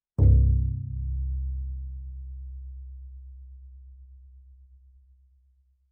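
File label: Bass pizzicato fortissimo sul C C1, Double Bass; MIS Pitches - 2014; Strings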